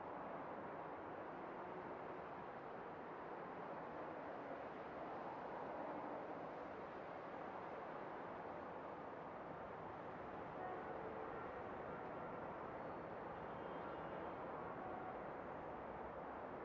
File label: ambience - paris city